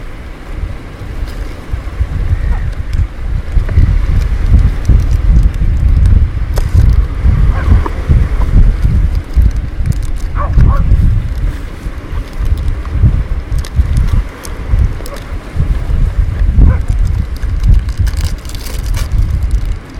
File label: Cape Paterson Bay Beach